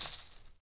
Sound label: METAL, Afro Shakers, Ankle Pods; Roland LCDP14 Africa VOL-2